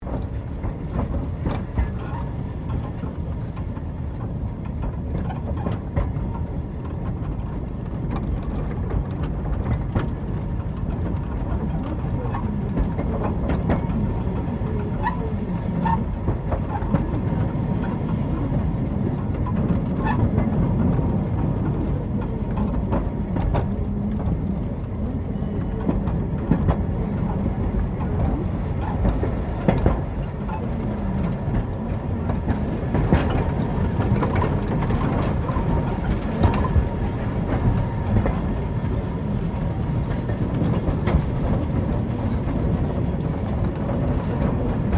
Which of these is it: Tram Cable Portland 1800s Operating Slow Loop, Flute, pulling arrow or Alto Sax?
Tram Cable Portland 1800s Operating Slow Loop